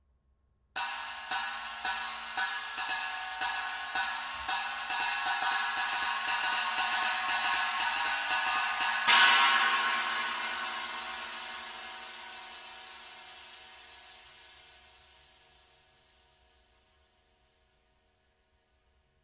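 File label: 22in Swish Knocker with Rivets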